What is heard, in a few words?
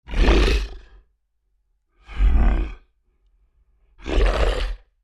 Creature Large Grunts Aggressive; Monsters; Horror; Big Room Sound